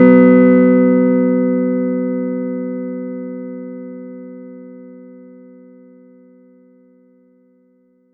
Chord; ModelCycles

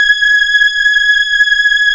MIDI pad, synth pad

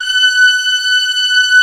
ORCH F#4 FF, Orchestra; Sound On Sound; Peter Siedleczek's Orchestra

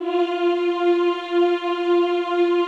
F3, Classical Choir; Best Service; Extended Classical Choir

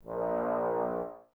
Brass, Woodwind Brass Ensemble; Miroslav Vitous - Woodwind Brass Ensemble

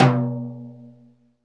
PRC TIMB

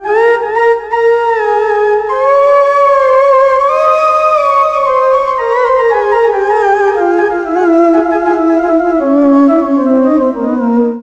SYNTH, ARABIC

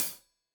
Hat Tight, Hi-Hat, Cymbals; Deeply Sampled Drums

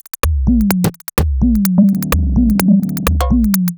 Nibble 127bpm, House Drumloops; 90 s Sample CDs; Zero-G House Fabrik Drumloops; ZG Drumloops

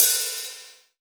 HH AMB, AMBIENCE HH

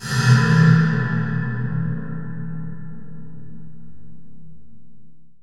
GOTHICK FLY; ENVIRN-AMB

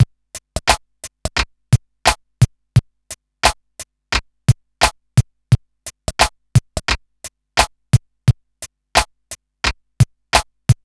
BEAT R; USB Soundscan vol.31; Miro s Grooves; AKAI

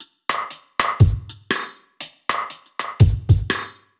Drum Kit Dry 120, dub drums samples, 120bpm; musicradar

